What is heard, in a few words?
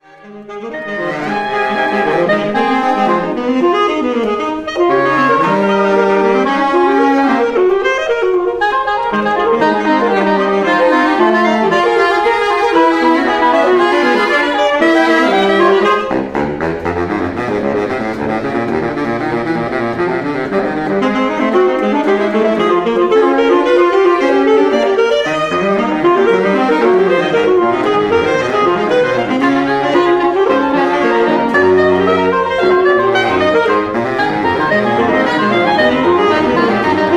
banjo